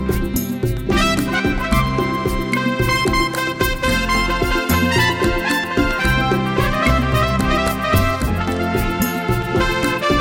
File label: Slow Bolero